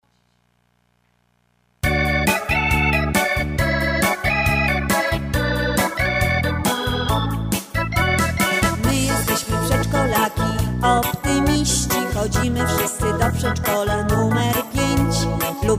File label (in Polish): hymn optymistyczny